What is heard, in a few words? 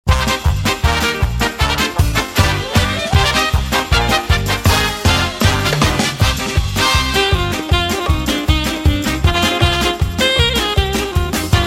hora, instrumental